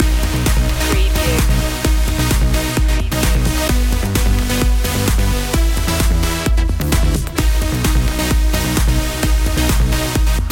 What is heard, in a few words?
summer trance loop